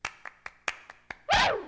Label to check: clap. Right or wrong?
Right